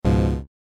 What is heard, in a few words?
alert